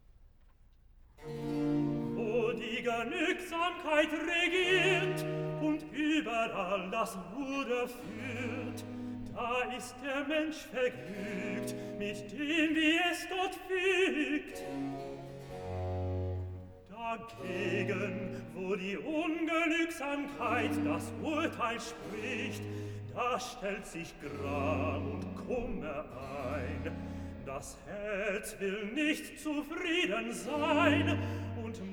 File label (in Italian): Recitativo; Cantata, BWV 144 'Nimm, was dein ist, und gehe hin'; 'Wo die Genügsamkeit'; Bach; Naarden; Septuagesima